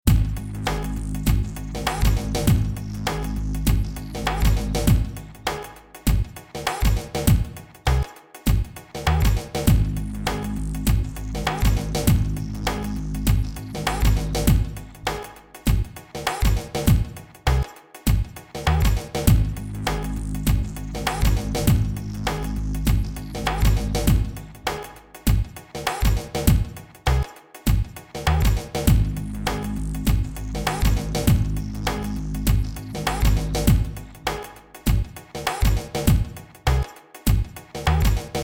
G 100 i Drum Groove

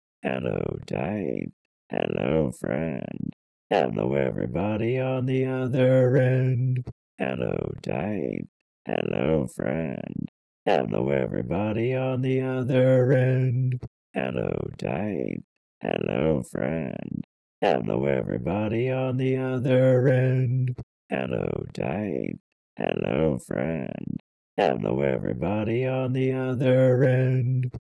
vocal

